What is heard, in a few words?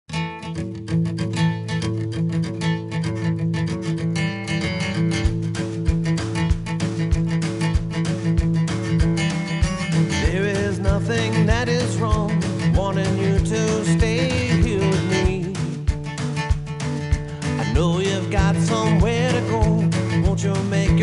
solo